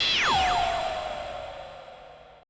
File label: AMBI FX